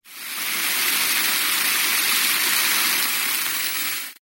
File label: Roast Sound